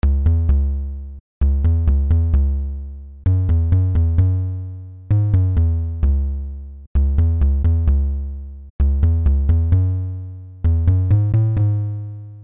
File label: SUB BASS